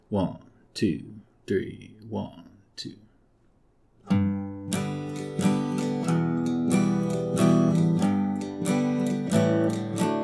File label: star spangled banner backing track g major